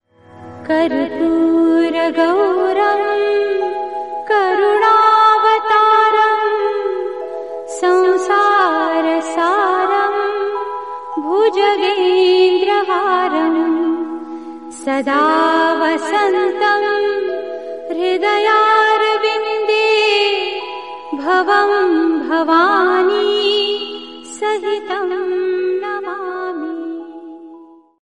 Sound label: karpur gauram karunavtaram female